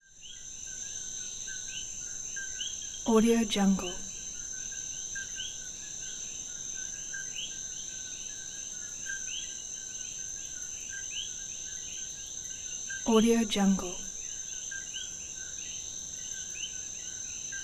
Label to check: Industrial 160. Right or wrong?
Wrong